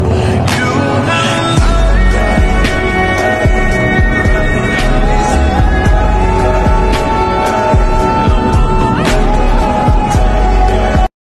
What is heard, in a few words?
slowmotion smooth; capcut template